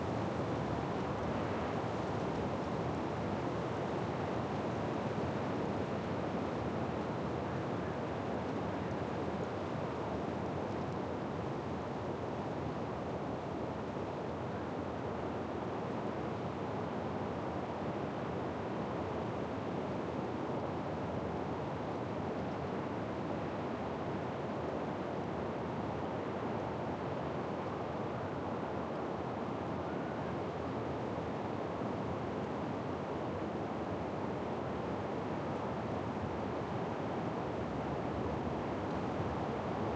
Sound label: ambient; World of Tanks